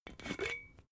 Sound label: glass bottle massive open cap short